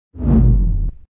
Ok Botton Spaceship; AudioSpeed

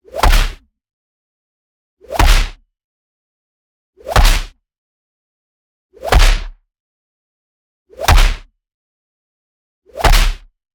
PUNCH Roundhouse; boom library; Anime Essentials

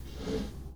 Foosball Center Slide

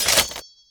Pickup Armour; SFX Kit; Pickups

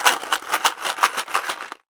Box of Push Pins Shake; Office Supplies; 1001 Sound Effects

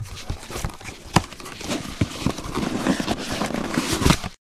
gift open; firefight

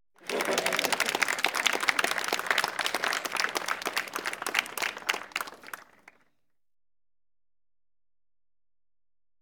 Applause; KMP Film Video Sound Effects

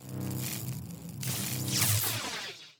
Lightning Spell Ready; AudioWizards

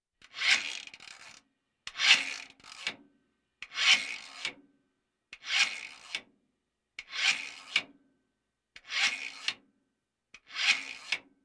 Military vehicles

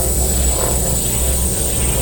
Teleport Beam Loop; SFX Kit; Sci-Fi Loops

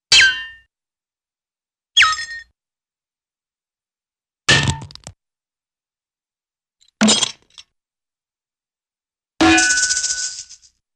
Metal Hit w-whistle Bonk Thump Rattle; Cartoon; Hollywood Edge; Cartoon Trax

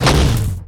Creature Ranged Physical; Kilta

